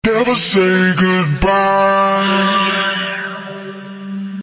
male , male singing

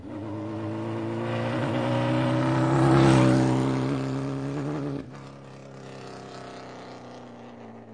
motorcycle pass; Sound FX Real Life

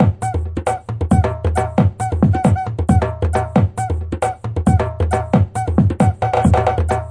135 funky brazil

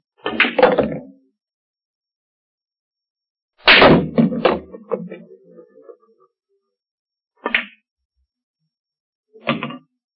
pool play flanged; Sound FX Real Life

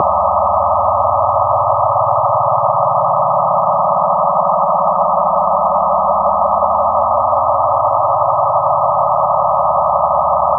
thinpass noisefloor; Electro and Synthetic

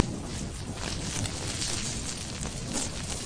flanged fire; Various Strange Sounds and Effects

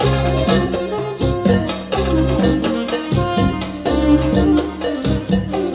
triplet middle east; MELODIC LOOPS; SAMPLED MUSIC LOOPS

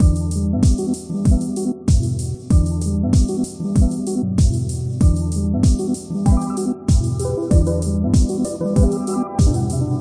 096 mellow melodic techno; SAMPLED MUSIC LOOPS

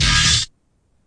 orch hit; Punches Hits Discoblasts; single hits

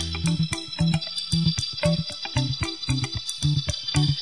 114 reggae, sampled music loops

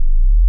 sweep 20 50 Hz

